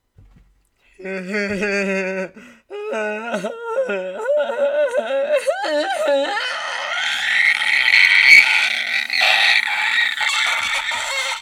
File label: laughter